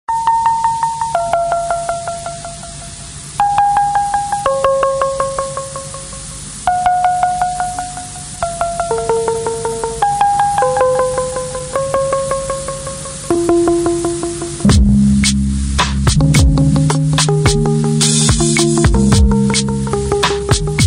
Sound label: ommm air marimba